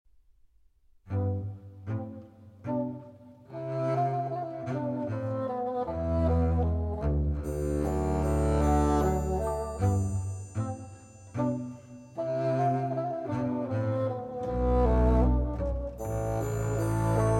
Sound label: Arr. For Chamber Ensemble